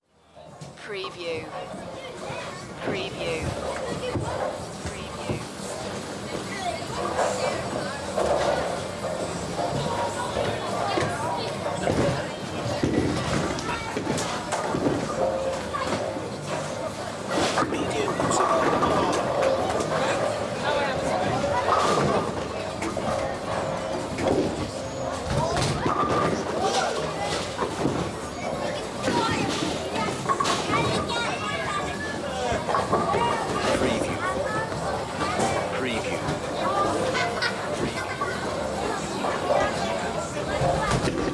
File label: Crowd, Bowling Hall Ambience, Very Busy